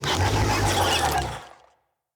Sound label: Infested Big Ashen Vocal Attack